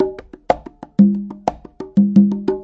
090 bongos, 090 bpm; Bazillions of Bongos

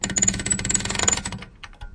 advance paper slow, Typewriter; UNUSUAL KITS and FX; Bauhinian Vibes Typewriter Kit

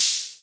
rhma hh, Whippany Rhythm Master; drum machines; 1970s

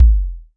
Visco Space Drum; drum machines

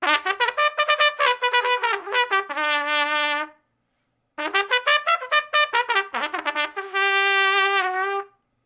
shitty trumpet playing; Sound FX Real Life

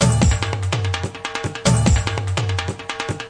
145 indian busy beat, drum loops and breaks; 141 to 160 bpm

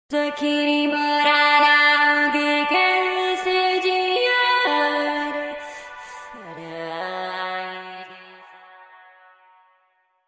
hindi robot, synth and electronic loops; melodic loops; superheart

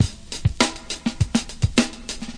101 gold in my pocket, DRUM LOOPS and BREAKS

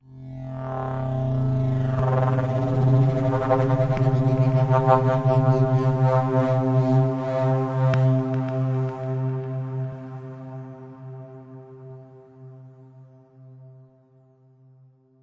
approaching spindown synth; SYNTH KEYS ETCETERA

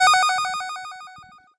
deedle

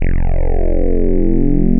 sweep bass

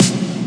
melbourne bounce snare big; Hip Hop; STYLE KITS; D3ron Melbourne Bounce Kit

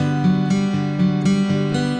acoustic guitar picking

